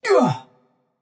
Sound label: grunt hit; smite; xbalanque